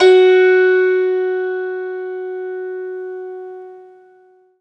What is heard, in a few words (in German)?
HACKBRETT; Alpine Volksmusik